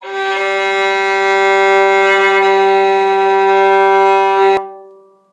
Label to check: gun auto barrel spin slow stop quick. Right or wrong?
Wrong